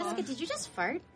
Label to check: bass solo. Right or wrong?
Wrong